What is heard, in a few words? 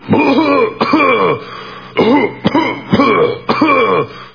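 cough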